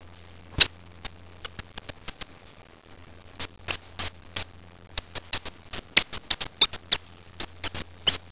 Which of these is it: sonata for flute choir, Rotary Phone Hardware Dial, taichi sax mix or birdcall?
birdcall